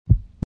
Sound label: heart, humains